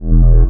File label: gravity link pass effect; Earth and Beyond; EA Games